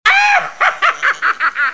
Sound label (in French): Ah ah ah homme joyeux, Rires